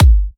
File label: G, Kicks; markus hakala